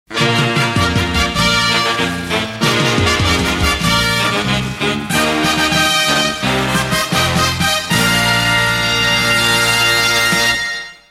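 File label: fanfare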